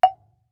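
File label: Marimba deadstroke fortissimo Gb4; MIS Pitches - 2014; Percussion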